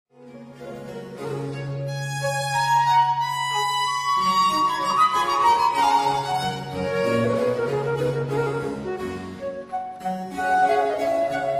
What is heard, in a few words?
for 2 violins & continuo; Les Nations; Rondeau